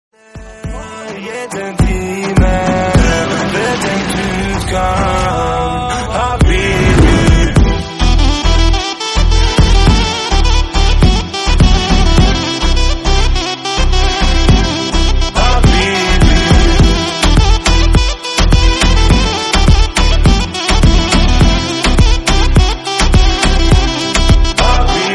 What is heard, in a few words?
instrumental bgm